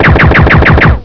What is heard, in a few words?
chaingun; Maschinengewehr; duke